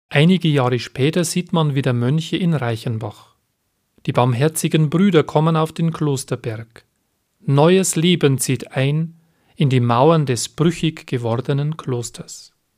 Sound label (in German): singspiel